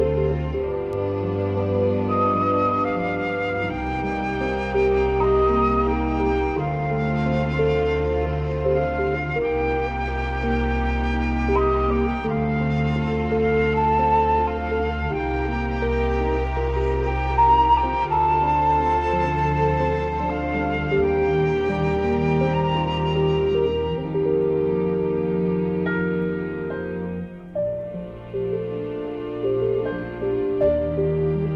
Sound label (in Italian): Piano E Archi; Sonor Music Editions; soundtrack for the obscure drama movie from 1974